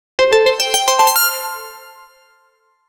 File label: logo reveal